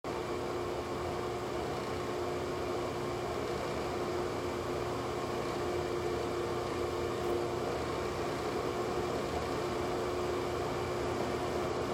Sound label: Exhaust Fan